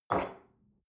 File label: espresso grab from table medium, restaurant cafe